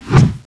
summon stab, weapons

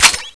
kronos7 activate, weapons

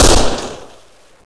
acr unsil; ametyst weapon vip

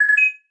fiveseven beep, weapons

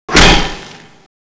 lance field attack actuation, weapons

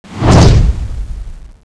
attack, giant; dione

